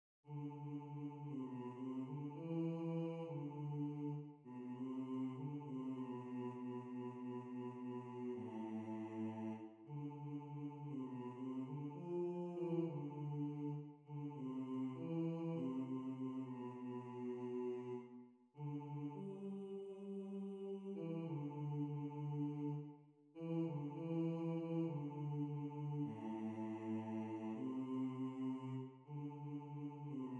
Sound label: alto